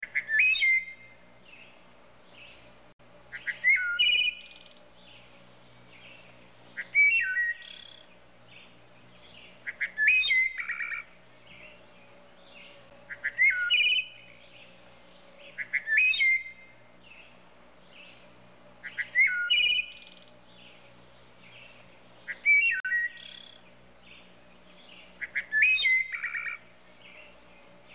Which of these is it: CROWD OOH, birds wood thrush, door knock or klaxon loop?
birds wood thrush